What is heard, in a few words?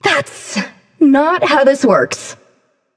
death; smite; amaterasu